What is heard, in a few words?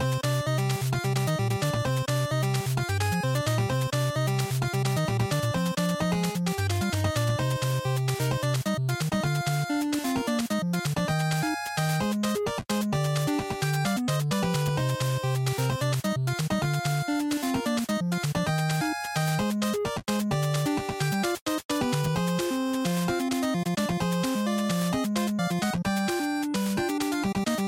Action Stage Loop, bgm